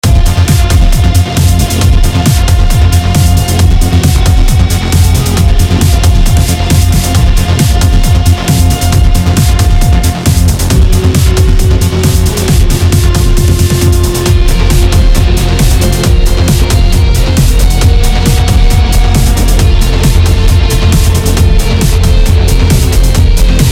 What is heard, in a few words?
combat; synthetik